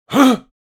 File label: Elderly man surprise gasp; misc human